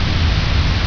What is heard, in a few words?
white noise